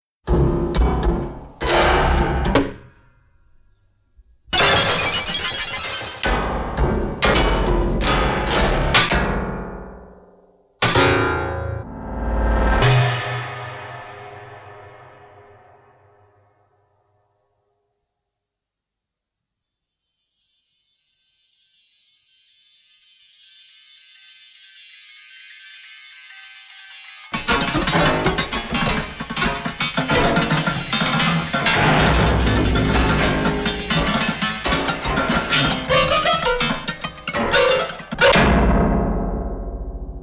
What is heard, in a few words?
Pianoforte excerpt